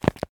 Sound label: DOLL WALK; don t be afraid the first toy